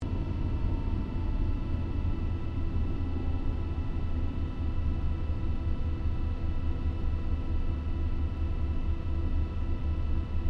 AIRCRAFT LOCKHEED L-1049 SUPER CONSELLATION IDLE INTERIOR; Connie, Super Constellation; 1955; Airplanes